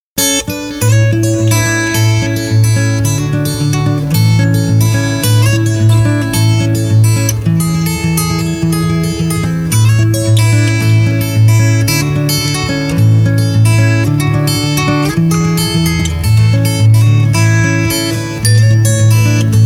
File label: Lullaby